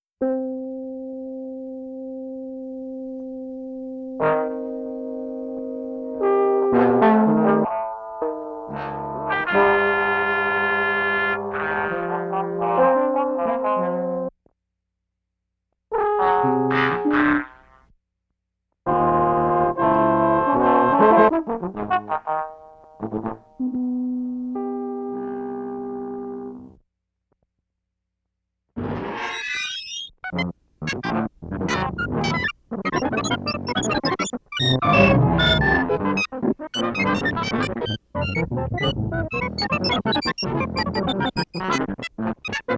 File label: Textures; lecaine; Compositions Demonstrations; Le-Caine Hugh